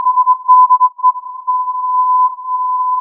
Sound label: filtered input